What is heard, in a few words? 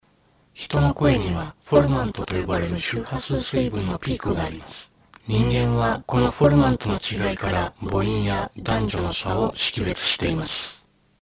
formant test